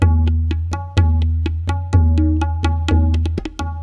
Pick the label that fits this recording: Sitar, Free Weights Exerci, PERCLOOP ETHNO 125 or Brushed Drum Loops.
PERCLOOP ETHNO 125